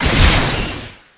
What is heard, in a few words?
Rocket Launcher Shot; Weapons